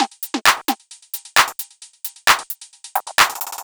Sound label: Beat 132BPM (No Kick), techno; techno drum fx